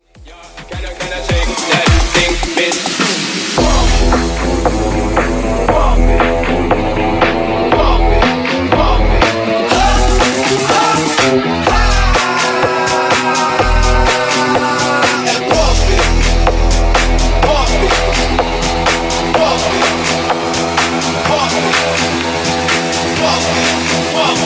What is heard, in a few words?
Breakz 105 126 Transition